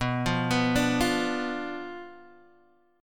B Diminished B Guitar Open D

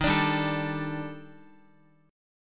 D sharp Major Sharp 11th, Guitar; D#M#11; strummed

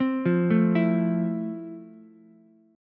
F Major 7th F, Ukulele; arpeggiated; JGuitar

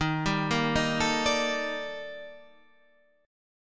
D sharp Major Sharp 11th

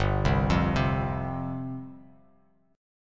G 7th Suspended 4th G down; G7sus4 arpeggiated

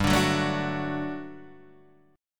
G Major 7th Suspended 2nd G, Guitar Standard; GM7sus2 strummed; 3,5,4,2,3,2

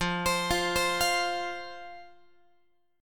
F 5th F, down, Guitar; F5 arpeggiated

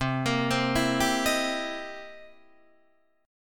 C 7th Sharp 9th, Guitar, Open D

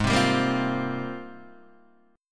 Ab Major 13th Ab, down, Guitar, Open D

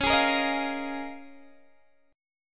C sharp 6th Add 9th, Guitar, Open G; down; chord shape

